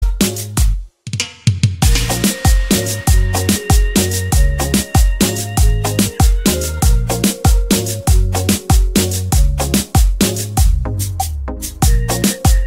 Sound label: CUMBIA; jingles